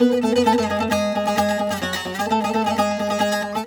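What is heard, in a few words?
G#, bouzouki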